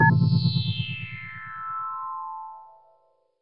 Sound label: Mirrorhit; tempest 4000